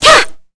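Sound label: Vox Attack, Korean; Kara; kingsraid; voices heroes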